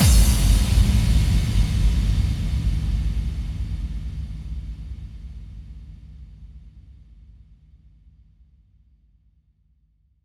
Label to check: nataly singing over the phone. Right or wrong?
Wrong